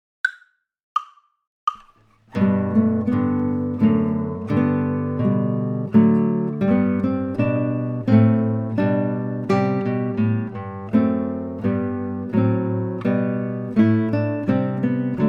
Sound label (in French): Menuet